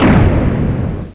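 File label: door close, doors; Quake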